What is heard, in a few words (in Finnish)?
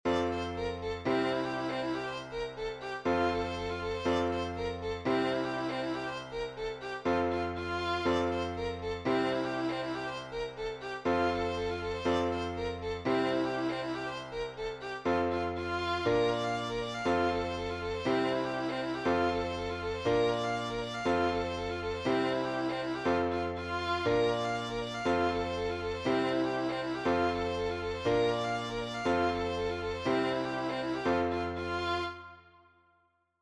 tuusulan polkka